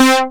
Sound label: SEQUENCER, synths & keys; Techno Trance